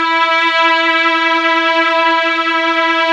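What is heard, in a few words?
violin ensemble; 90s Sample CDs; Club-50 - Foundations Roland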